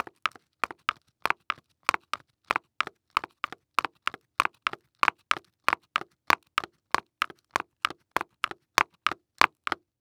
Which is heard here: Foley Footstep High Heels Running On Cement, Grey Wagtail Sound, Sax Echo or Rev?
Foley Footstep High Heels Running On Cement